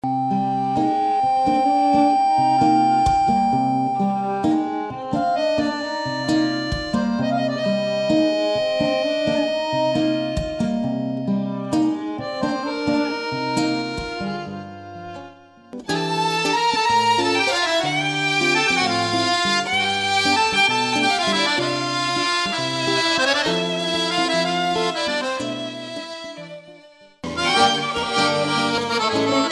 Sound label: Acoustic Music Ensemble